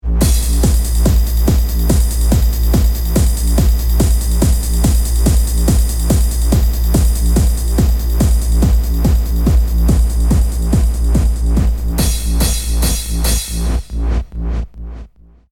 Beat

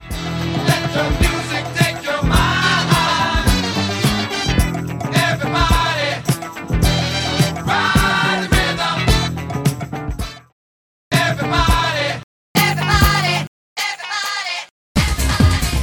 Vocals everybody

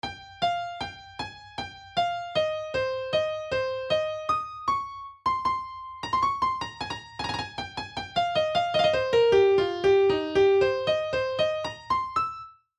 piano

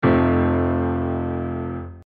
F maj low register octave

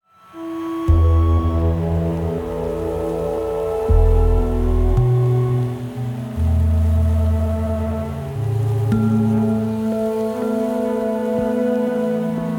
Skysounds

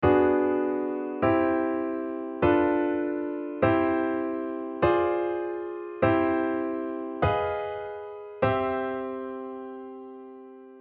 minor 6th to tonic

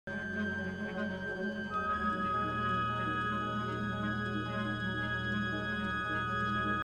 Clocks And Clouds; Ligeti

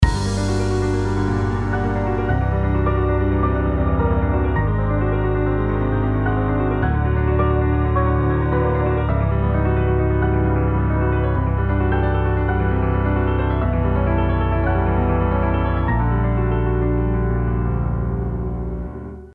arpegiated polychords

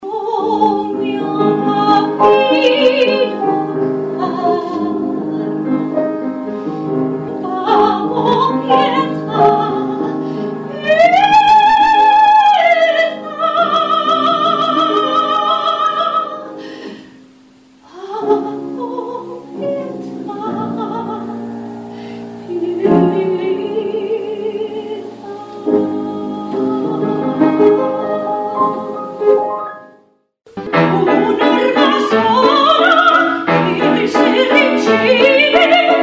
mix songs opera

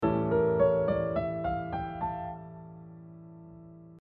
mixolydian b2 b6

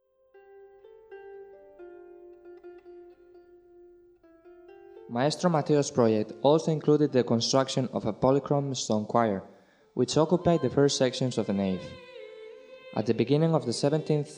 STONE CHOIR

